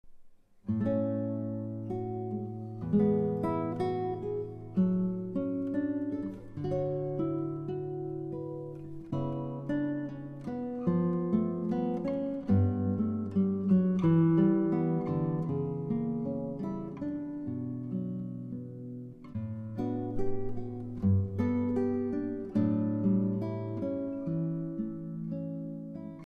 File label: adagio espressivo